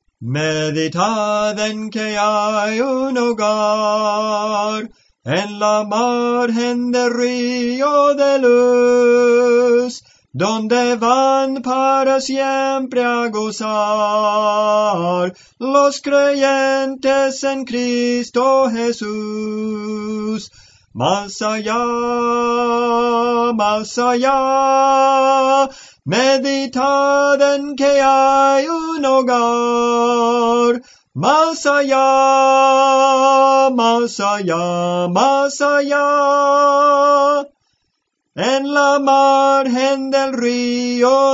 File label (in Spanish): Soprano, Cantos Espirituales; Soprano (melodía); Clase del Canto